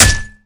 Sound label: bottle intact hit flesh, weapons